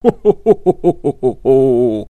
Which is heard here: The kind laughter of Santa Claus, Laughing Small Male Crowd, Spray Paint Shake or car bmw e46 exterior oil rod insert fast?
The kind laughter of Santa Claus